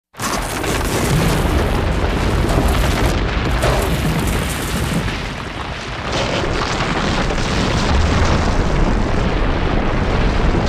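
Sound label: Explosive Earth Rock, earthquakes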